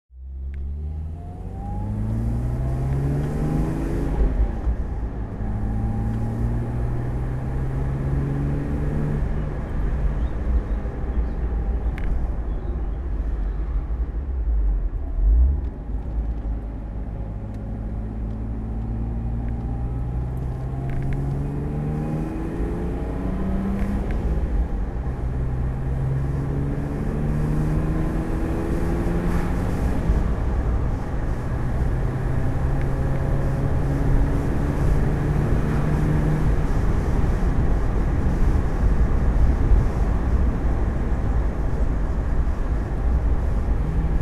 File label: mercedes 250SL pagoda drive; smiths